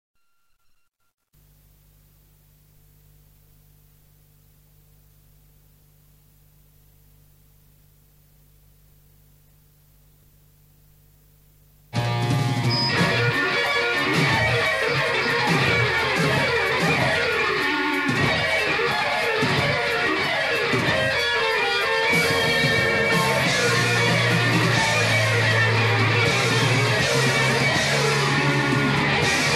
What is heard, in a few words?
g solo